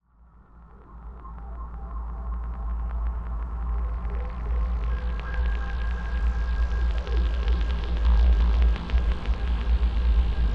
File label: atomar Noise